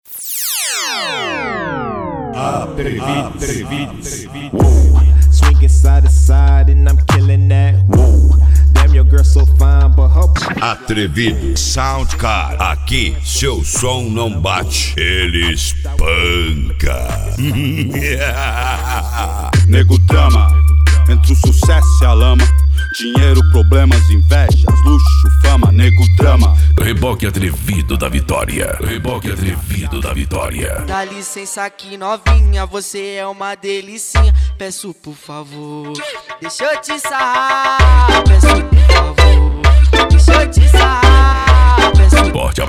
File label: funk mega bass